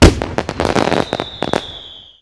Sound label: firework explosion multi